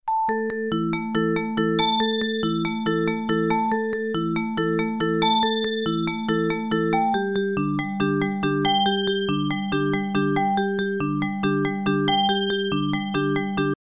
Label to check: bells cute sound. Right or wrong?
Right